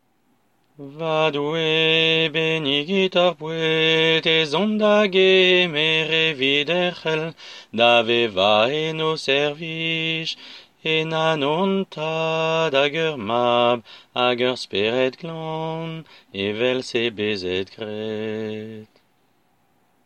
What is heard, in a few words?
Vocal